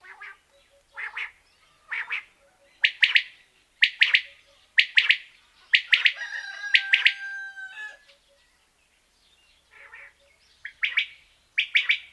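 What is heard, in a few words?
perepelka golos, quail voice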